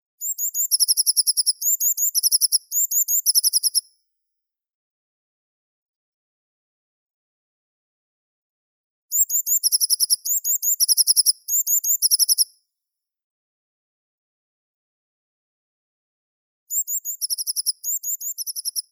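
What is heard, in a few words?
blue tit, Cyanistes caeruleus; lazorevka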